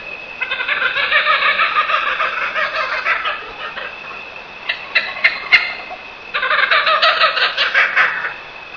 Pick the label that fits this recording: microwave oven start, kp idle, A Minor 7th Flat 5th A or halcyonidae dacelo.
halcyonidae dacelo